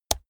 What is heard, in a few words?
keyboard laptop space push hard